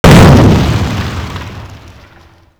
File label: small explosion; WAR